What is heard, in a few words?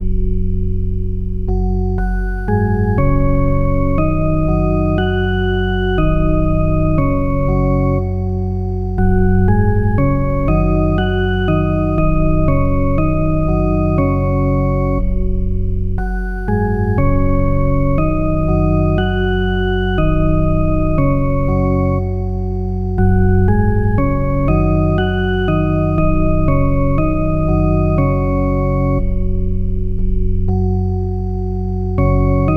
organ, chiptunes